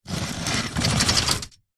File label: household clothes airer metal drag concrete short; zapsplat